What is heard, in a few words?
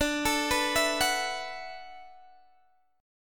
D 6th Add 9th, Guitar DADGAD